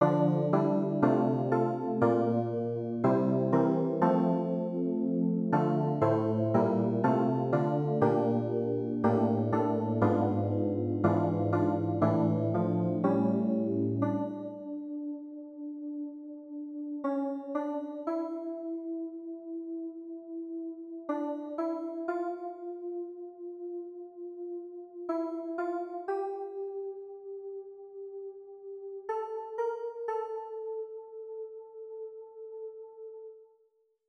CLAVIER; PSAUME 31

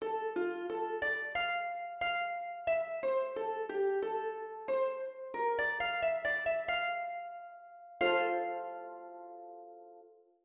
harpe

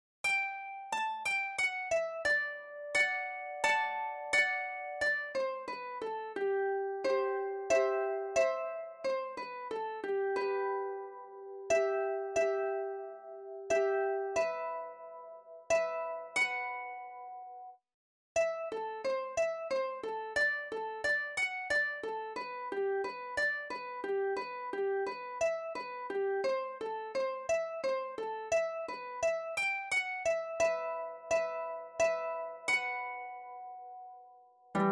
HARPE

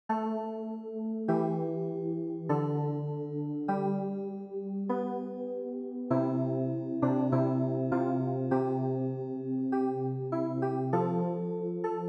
clavier

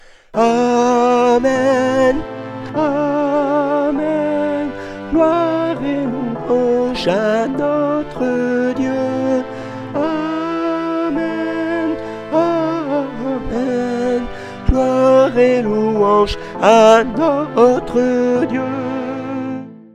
DOXOLOGIE solennelle TENOR

